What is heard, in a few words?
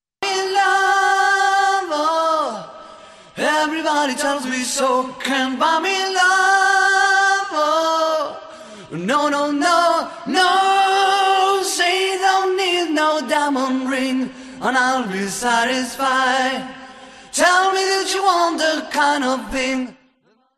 vocal lead